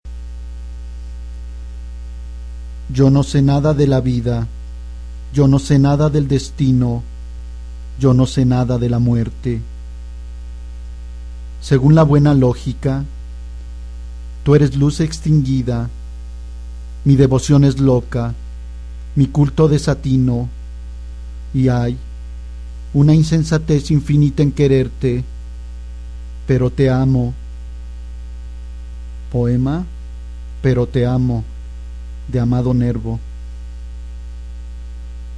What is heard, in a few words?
bass